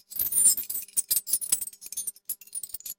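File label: long earrings swaying; ukrasheniya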